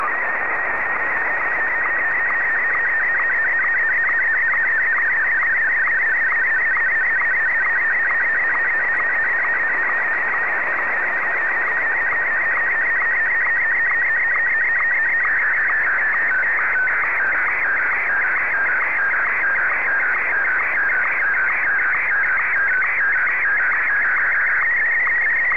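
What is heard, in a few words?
Martin 1, slow-scan television; VK3DFL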